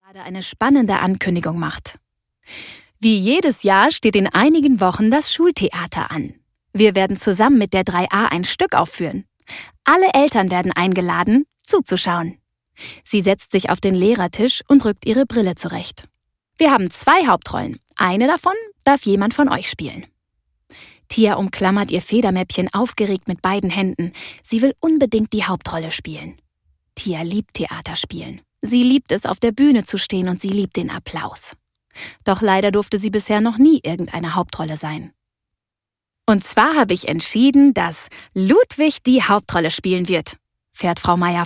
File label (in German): Applaus